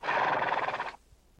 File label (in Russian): fyrkane loshadi, zvuki loshadi i konya